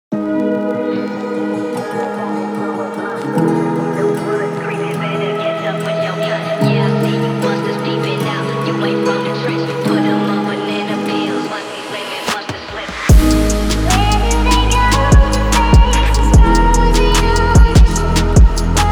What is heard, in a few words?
Phonk